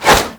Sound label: Whoosh, weapons; Infested; Coda Hirudo